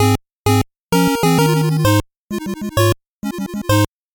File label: 8bit jingle slow